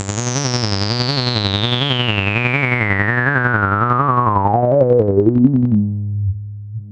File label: arpeg, Synths; fatman